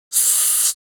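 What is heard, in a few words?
jeers, Spanish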